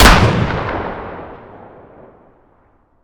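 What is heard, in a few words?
weapon dmr fire player atmo ext; gmod; viper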